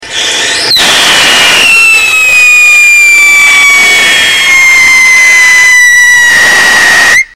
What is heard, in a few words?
potassium benzoate plain mo plus 20 ti, rockets whistle tests; Titanium +20; Core Burner; Titanium was 20-200 mesh spherical.